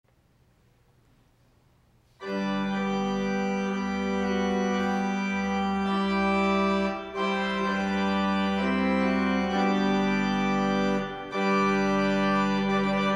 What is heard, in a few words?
hymn; 2014 fall church music festival performance